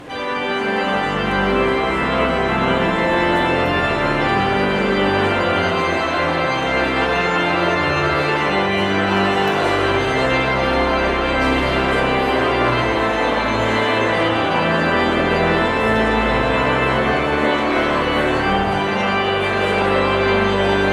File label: Postlude